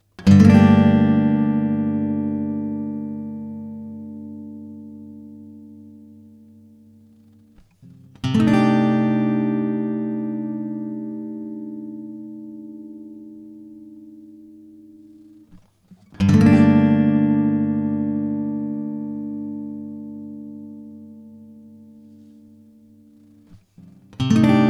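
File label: Gm 120 guitar chord strums; GTR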